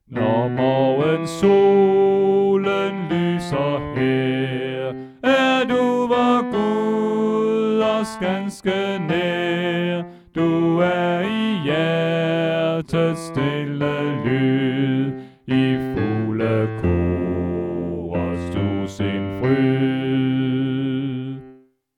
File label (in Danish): Bas; oevefiler